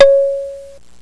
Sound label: gong